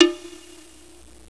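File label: wood block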